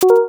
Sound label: cute bubble pop